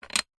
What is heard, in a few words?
leisure game plastic panel set down on game board; rubiks race